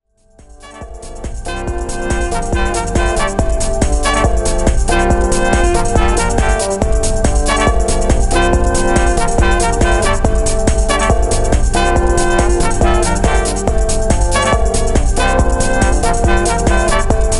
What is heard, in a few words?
Electronica, horns